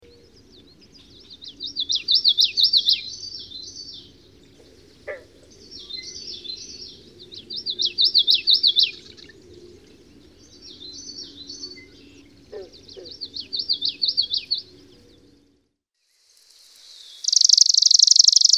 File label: Common Yellowthroat